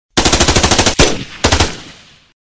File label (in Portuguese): efeito sonoro de tiro